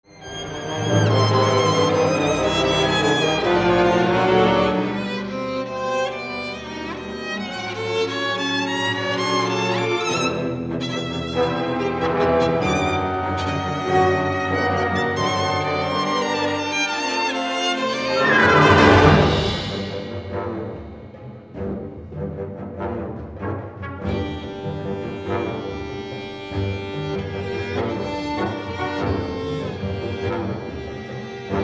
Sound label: Violin Concerto